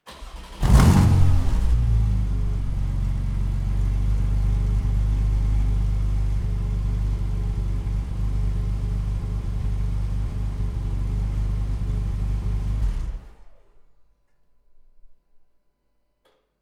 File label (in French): DEMARRAGE